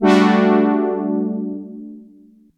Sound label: synth one shot expensive chords G maj